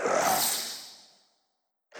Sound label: Ghost Run